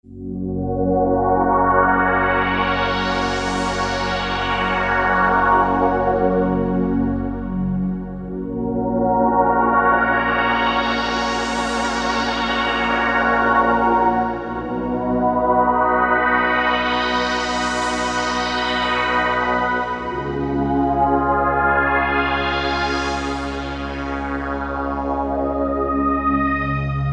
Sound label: OMNI STRINGS; Akai SG01v